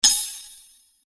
Sword Sound; FXHome; Fighting Sounds

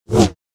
Saber; FXHome

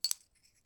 chips Handle, Casino; Kenney's Sound Pack

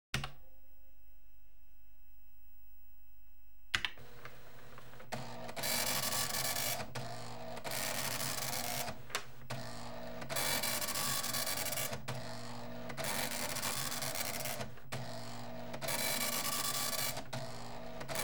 Computers, Telex AND Typewrite; Computers Printers Phones; BBC Sound Effects Library

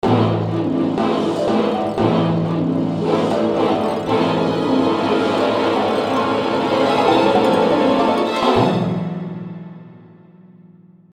Orchestral Phrase; FXHome

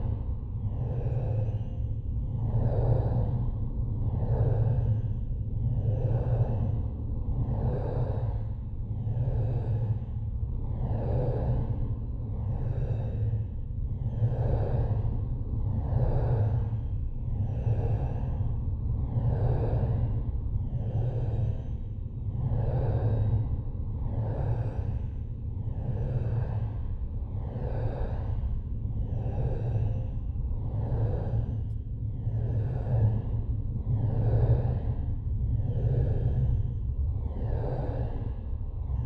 Dark Pulse Ambience - Loop; The Sound Pack Tree; Unreal Ambiences

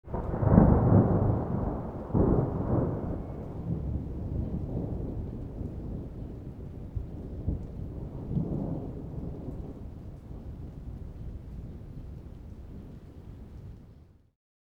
thunder clap

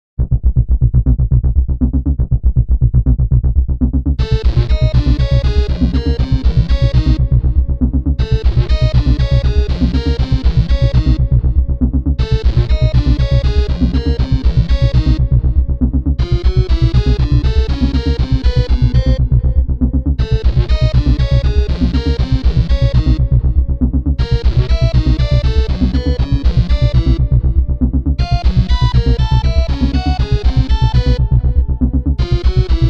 Harsh Alien Machine

